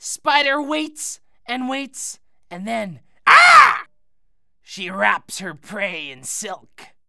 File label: TCrow, Crow Sounds